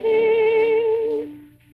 Dusty Reels Opera puppet; Audiowanderer

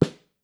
SNARES Snare Hit 505; Audiowanderer; Gimme SNARES Sample Pack

